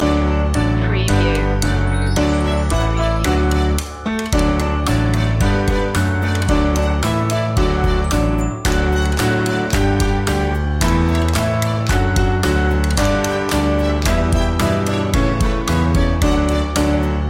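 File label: bingo instrumental loop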